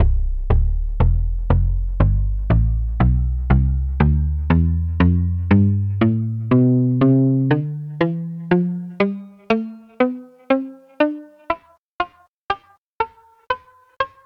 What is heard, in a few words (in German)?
palm muted guitar tonleiter